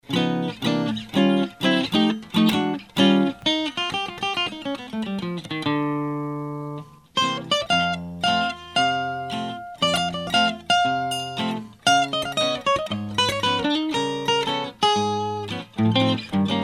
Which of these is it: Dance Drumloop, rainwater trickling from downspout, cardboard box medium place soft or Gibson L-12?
Gibson L-12